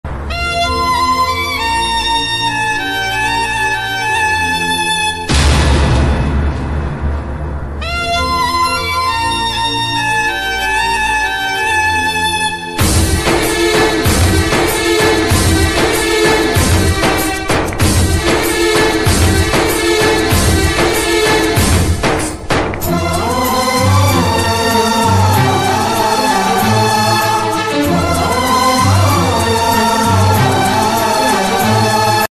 heart touching bgm; excuse me kannada movie

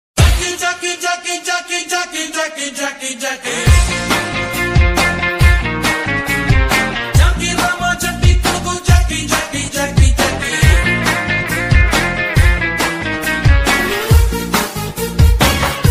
romentic ringtone; dharmendra; sunny deol

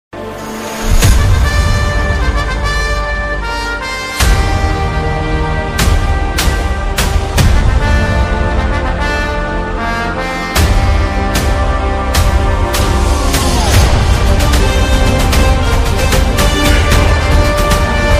movie theme song movie background music ringtone; jawan

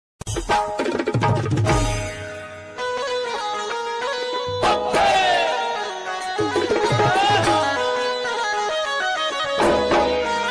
qawwali ringtone, turkish bgm ringtone

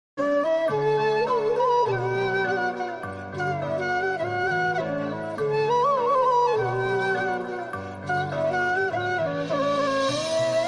flute ringtone; oh my god movie; krishna

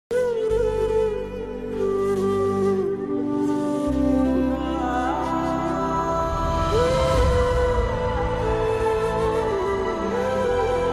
bgm ringtone sad bgm ringtone; bollywood

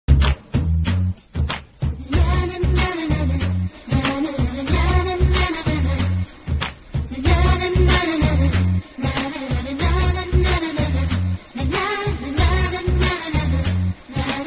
love bgm ringtone; sandakozhi explaining her love

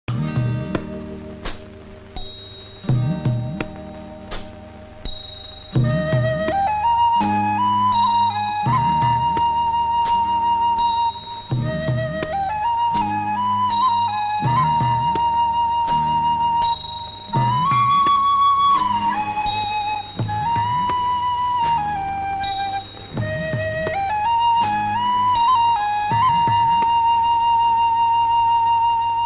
classical bgm; aarilirunthu arubathu varai

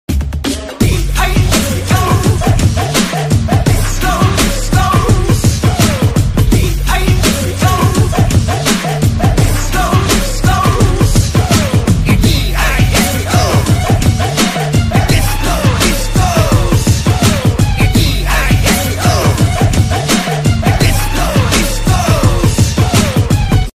disco title teaser bgm ringtone, tamil; tamilringtonesmusic

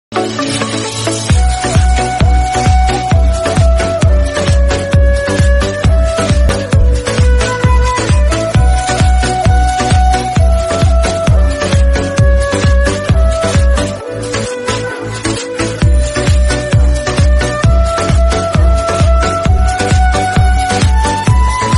movie remix ringtone

